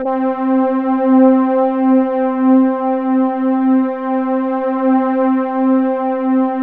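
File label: C4 big orchestra; Sound Gallery - Charged; BIG OBERPAD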